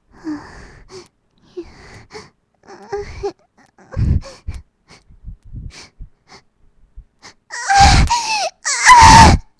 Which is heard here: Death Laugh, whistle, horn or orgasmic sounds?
orgasmic sounds